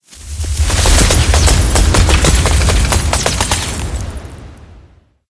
ice storm; smite; god merlin

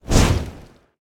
phoenix fire; smite; npc

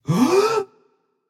nv breath; smite; xbalanque

